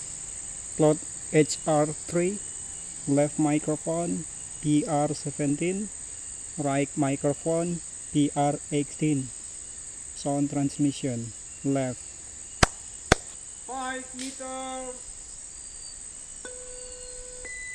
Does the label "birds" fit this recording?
Yes